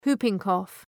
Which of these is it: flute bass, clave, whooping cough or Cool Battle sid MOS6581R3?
whooping cough